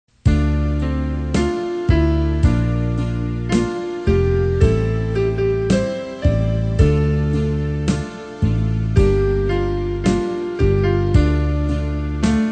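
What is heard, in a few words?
soprano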